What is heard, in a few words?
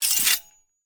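Metal Friction Designed Metal Sword Sheath; Melee Weapons; David Dumais Audio; Melee Weapons Sound Effects Pack 1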